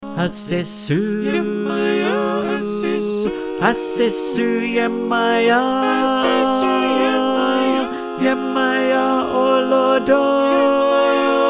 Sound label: Tenor line